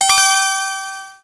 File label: Coin; Sega Sonic Hedgehog